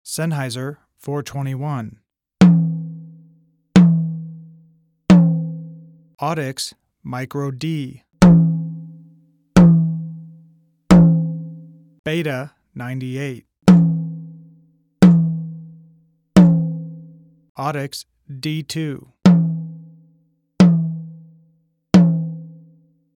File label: tom; microd; d2